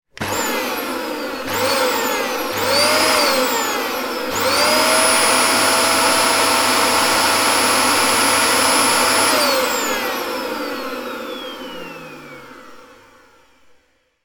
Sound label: household dyson upright vacuum cleaner switch on x3 false starts short burst off